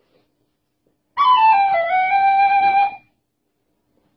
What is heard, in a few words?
mission complete sound; mount and blade